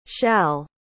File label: Whisper Sssss